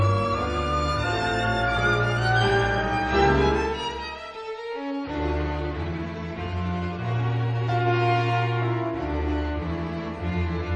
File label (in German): Violinkonzert; Max Reger op.101